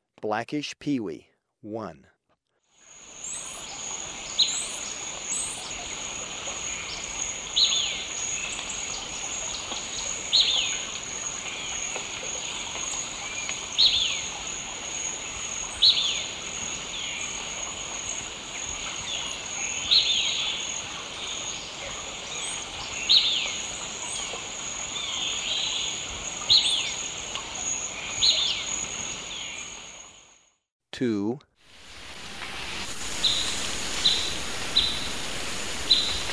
Blackish Pewee, Birds of Ecuador; S. America

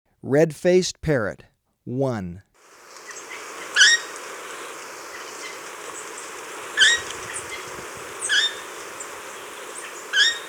Red-Faced Parrot; S. America

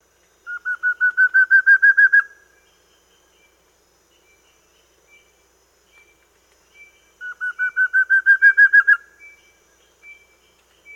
Fulvous-Bellied Antpitta; S. America

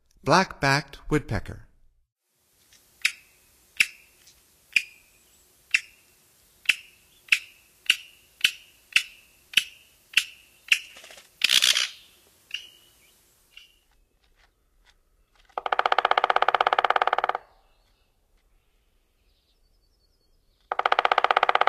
Black-backed Woodpecker; North America; Stokes

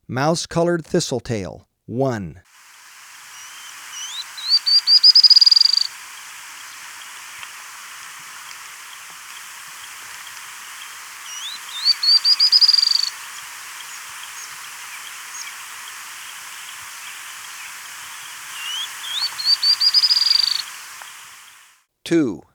Mouse-Colored Thistletail; S. America

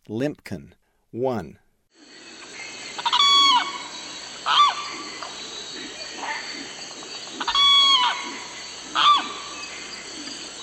Limpkin; S. America

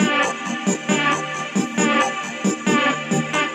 Synth, Techno

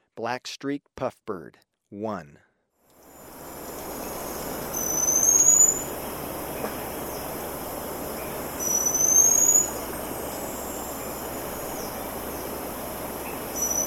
Black-streaked Puffbird, Birds of Ecuador; S. America

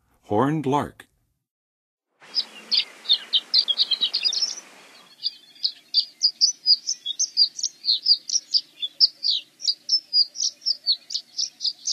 Horned Lark, Songs of Birds; North America; Western USA; Western USA Songbirds

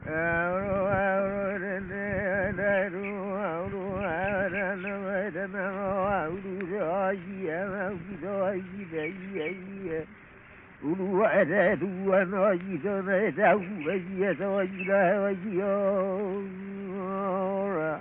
Prayer chant asking for inspiration, Hawai'i; World Music; Hawaiian; Na Leo Hawai'i Kahiko - Voices of Old Hawai'i

